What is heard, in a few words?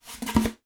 foley card tube handling